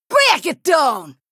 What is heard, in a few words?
Female Troll Attack